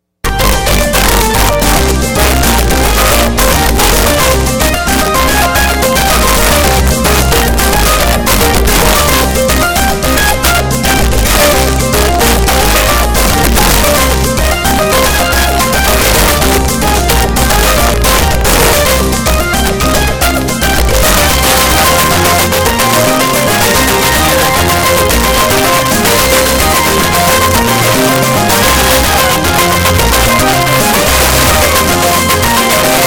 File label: Pianocchio